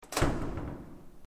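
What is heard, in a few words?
door close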